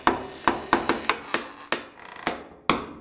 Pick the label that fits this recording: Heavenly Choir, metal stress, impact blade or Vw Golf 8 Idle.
metal stress